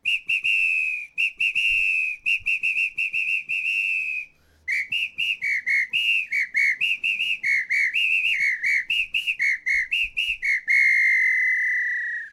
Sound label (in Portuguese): apito